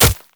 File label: basic attacks; Zyra